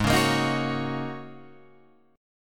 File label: G sharp 7th Suspended 2nd Suspended 4th G sharp Guitar Open F; G#7sus2sus4; 8,8,10,10,9,8; strummed